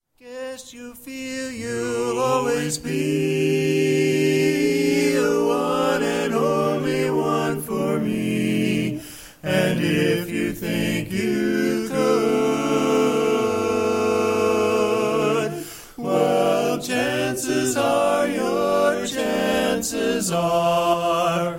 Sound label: Barbershop Quartet; Toronto